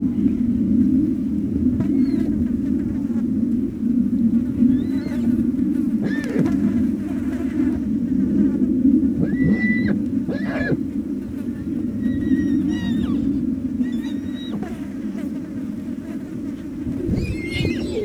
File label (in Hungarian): viharos erejű szél hátulról, vadló; Hortobágyi Nemzeti Park; pentazug